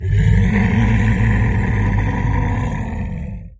mort, monstres; deadmaze

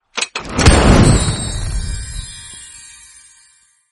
coffre

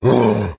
touche, monstres; deadmaze

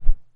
saut; transformice

